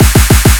Drum Fills 200

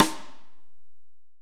STEREO AMB SNARE RIM; ST2AMBRIM1

